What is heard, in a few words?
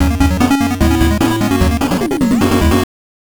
unknown jingle; Nester's Funky Bowling; Virtual Boy